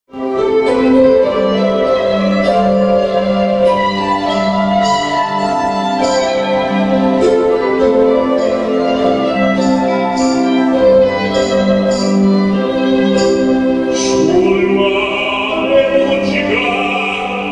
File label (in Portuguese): SANTA LUCIA TENOR E ORQUESTRA